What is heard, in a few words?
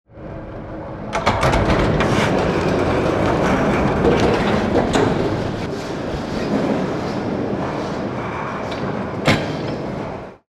cable car arriving